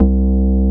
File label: BASS MONO